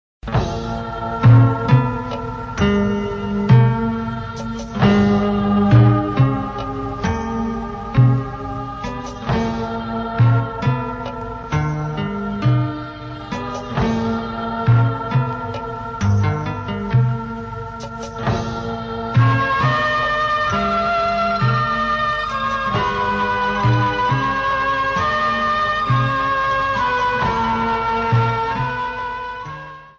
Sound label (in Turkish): islami fon muzigi; zil sesleri